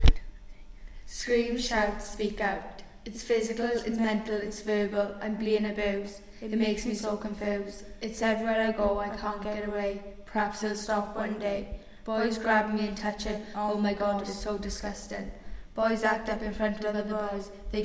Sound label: scream shout speak out